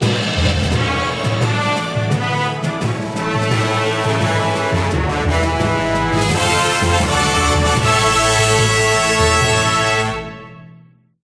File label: Finishline Fanfare; Ice Age: Continental Drift Arctic Games; X360